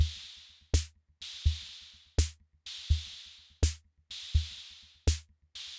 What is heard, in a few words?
Loop, rhythm king; classic machines